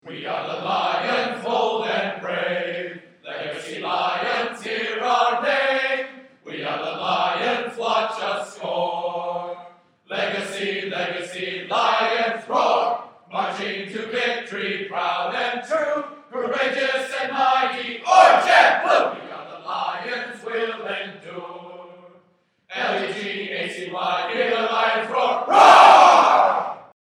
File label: Rouser Melody